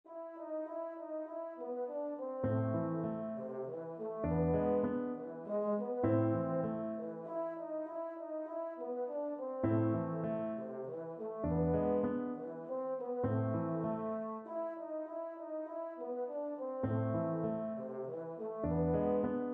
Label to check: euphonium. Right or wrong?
Right